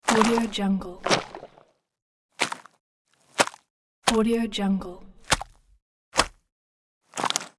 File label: bloody wet mud plops